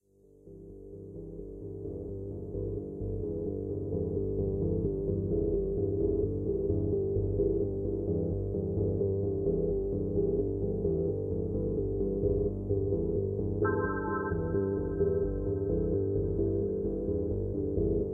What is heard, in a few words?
Synth Theme